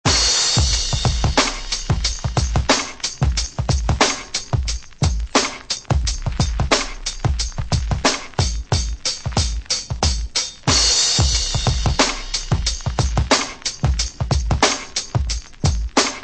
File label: drum loops